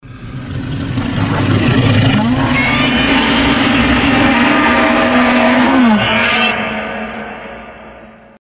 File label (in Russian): zvuk effekt akuly; Звуки ужаса